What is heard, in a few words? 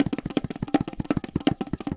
tabla 120